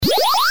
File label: eat, pacman